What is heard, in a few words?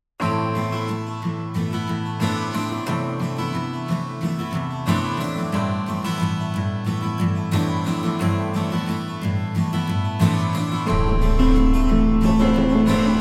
mountaindulc boombap